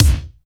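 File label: AGGRO KICK; Drums In Your FACE!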